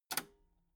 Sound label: metal button radio spring press